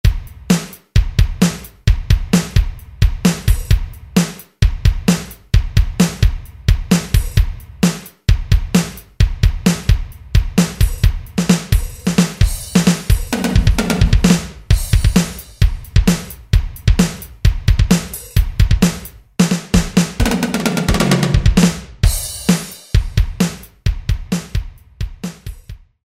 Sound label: Rock, Alesis SR-16; Preset Patterns